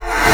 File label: AIR, BASSOON; Xsample VOL-6